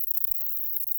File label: song, katydids, neduba arborea